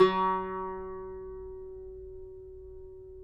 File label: CUMBUS F#, Guitars; Spectrasonics; Hans Zimmer Guitars VOL-2